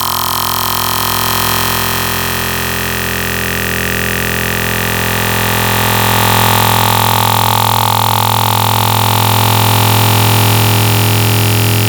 Squarified Vomit; Wavetables; Cymatics; Vomit for Serum Vol 2

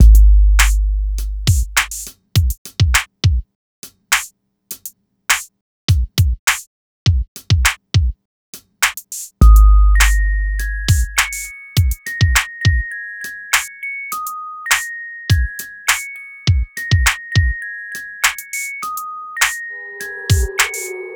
102 Ebmin, Hip Hop Exotica; Apple Loops; Big Fish Audio

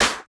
Snare Hit; Hip Hop; 098 Cmin; Hip Hop Exotica; Apple Loops; Big Fish Audio

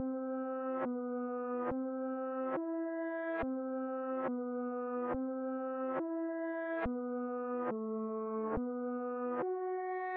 140 BPM E Min Pad; Cymatics; Destiny Gold Expansion; Chardonnay

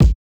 Kick Hit; big fish audio; Hip Hop Exotica; Emin

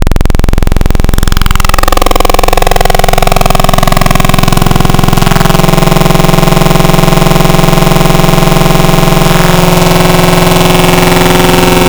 Detune, FM Wavetables; Cymatics; FM Wavetables Vol 3; Serum

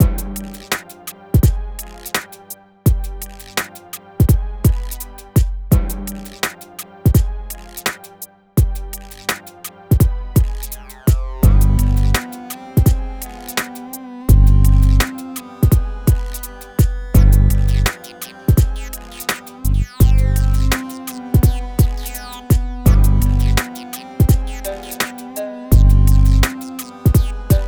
084 Gmin, Hip Hop Exotica

